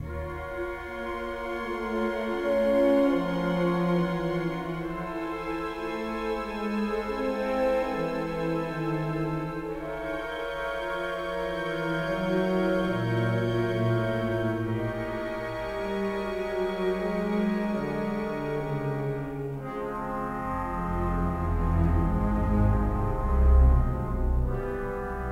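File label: Music Ui Death loop; Star Wars Episode III Revenge of the Sith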